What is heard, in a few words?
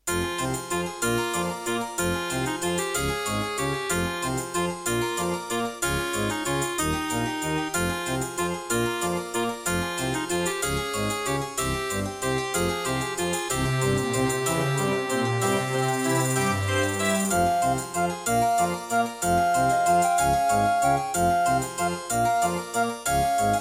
Track 6, Yamaha MU2000EX XG MODE; Death Gate